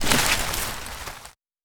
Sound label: Forest